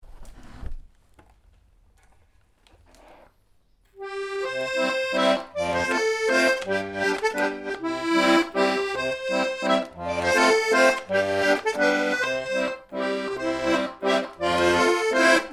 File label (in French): valse ecossaise accordeon